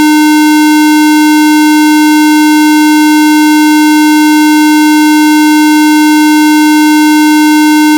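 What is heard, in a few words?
Oscillator Block 880hz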